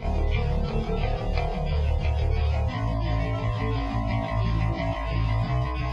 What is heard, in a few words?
Groove